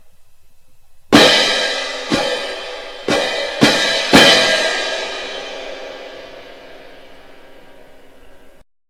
cymbals

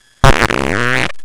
fart

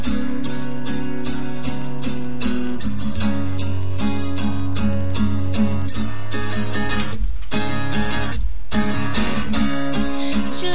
singing

